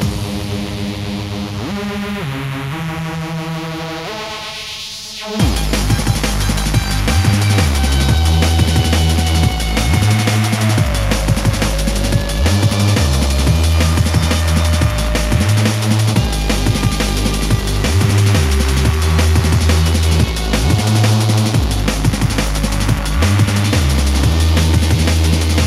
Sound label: music